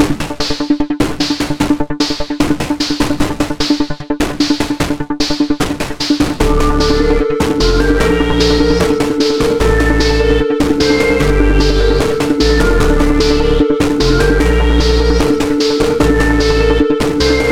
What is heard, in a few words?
Loop; Dual Blade: Battle of the Female Ninja